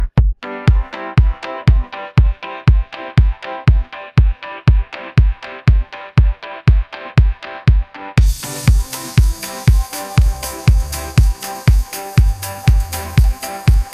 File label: ringtone edm